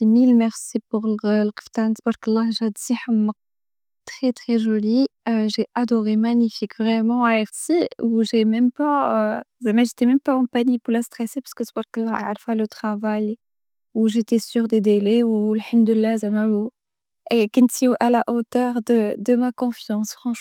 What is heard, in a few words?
VOCAL